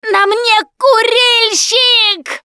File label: choke, russian, Survivor Voice Producer